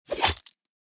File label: attack, cursed bear; quitoypongo